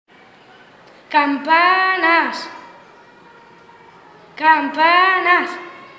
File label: bells; campanes